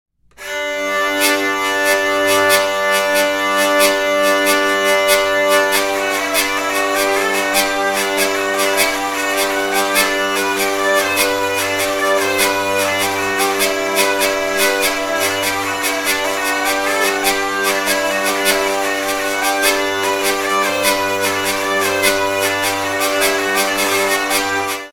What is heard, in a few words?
saltarello